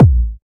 original kick drum one shot a sharp key, kick drum sample tuned to the A# note